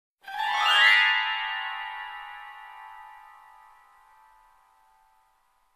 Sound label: Suspenseful upward glissando